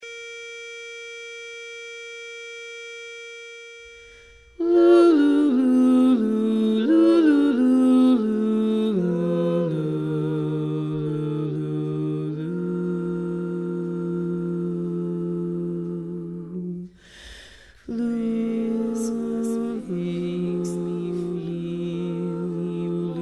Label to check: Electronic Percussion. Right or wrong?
Wrong